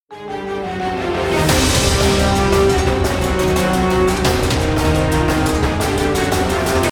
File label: BGM ghost closer Intro; bail or jail; obakeidoro; windows